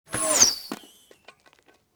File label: Whoosh, Bow Arrow Shoot, Magical, Surreal, Science Fict; Sound Design